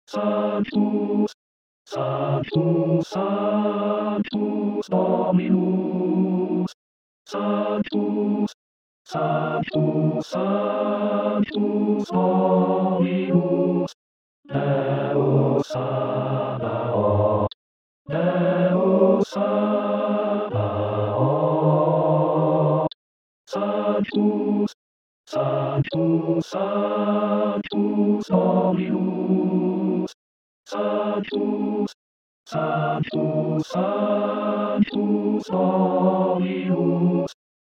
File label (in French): sanctus basse voix missa brevis